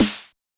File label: Yamaha DJX 2B, SD T9 L